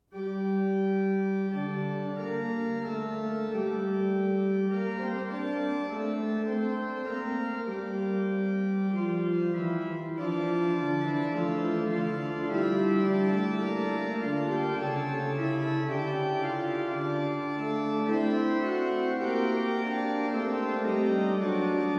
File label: Fughetta